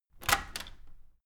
open door handle

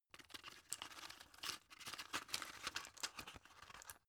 drug pull out shaky; berufe; arzt

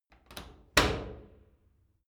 wood door old unlock hard

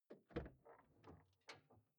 car door open exterior hummer h3

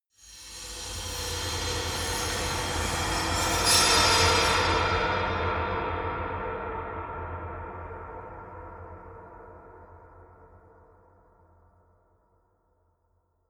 metal bright reverb; soundpool

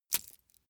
small metal crack soft; industrie wirtschaft; metall

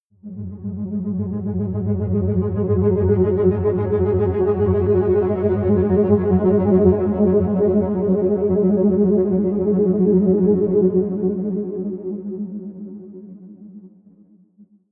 synth trill, drones; musikelemente

